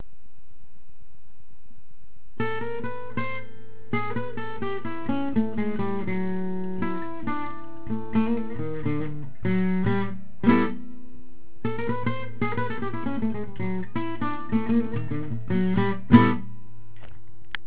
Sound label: am d7 g lick